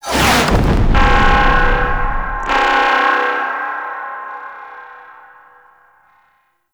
Temple Defense Start Waves; Warframe; Nineteeen Ninety Nine

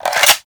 Reload; Warframe; Weapons; Strun; Reloads

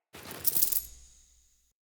Coin Pickup

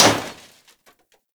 Scooter Impact; Ambience; Gameplay; Warframe; Nineteen Ninety Nine

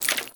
Generic Footstep; Warframe; Dagath

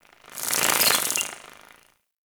Winged Insect Passby; Ambience; Warframe; The New War; Narmer Outpost

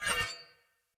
Short Sword Whoosh Accent; Weapons; Player; Swords; Sollos

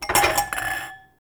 Glasses Clink; Warframe; The New War; Zariman

